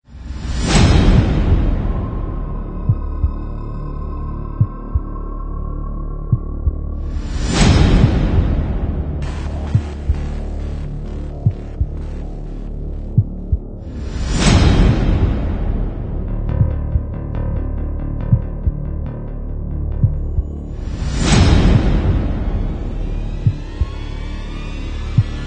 Intense Action Trailer electro version, 非常激烈的战鼓和电影气氛介绍歌曲

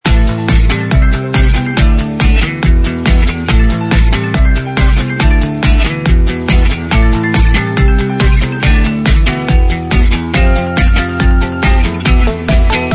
Positive Short Loop, 这是轻摇滚轨道使用现场电吉他，欢快的钢琴，鼓，低音和合成器的声音。

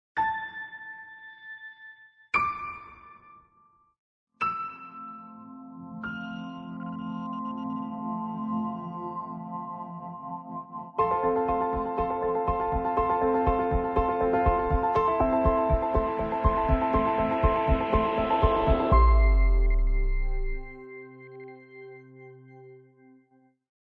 Epic Evolution, 它开始于一个美丽的钢琴模式，一些弦乐和声音出现，它结束在一个非常令人惊讶和充满活力的方式

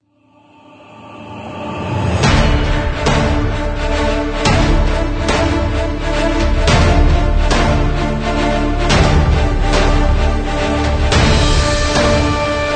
Epic Trailer, 强大的能量史诗项目与大鼓和管弦乐器。

